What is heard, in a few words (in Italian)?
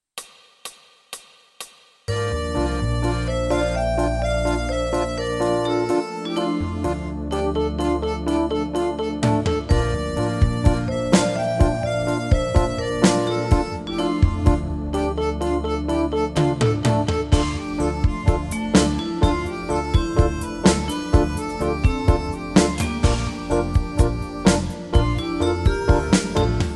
Fisa tutti